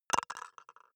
plastic cup drop wobble

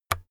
keyboard external key release

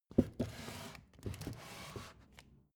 book shelve soft

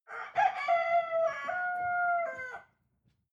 cock calling

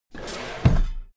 drawer cabinet equipment close heavy halfway

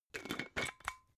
glass bottle massive close

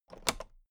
toolbox latch close regular

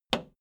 video tape player plastic rewind push

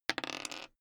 die roll table long

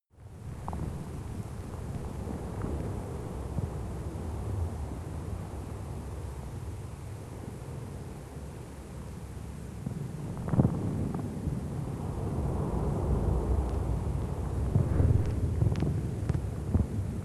water fx clicking

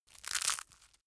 crunchy bright

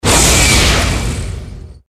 impact explosion

